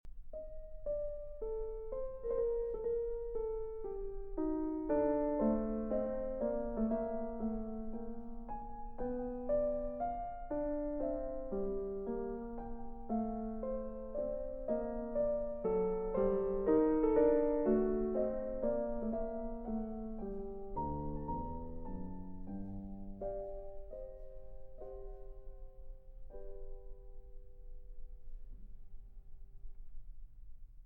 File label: Allegretto quasi andante in G Minor